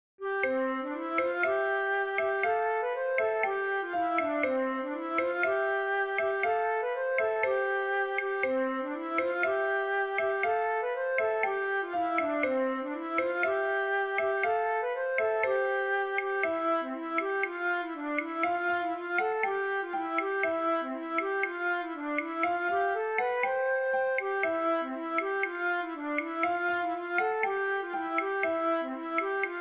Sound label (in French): tutti, vielle à roue; En passant la riviere; stage de vielle a roue